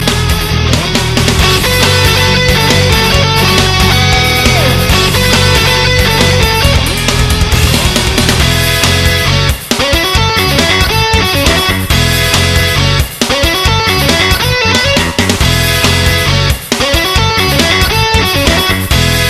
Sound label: Metal